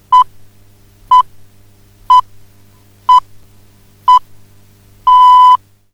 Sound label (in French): 6 bips horaires, FM; BBC; Solaris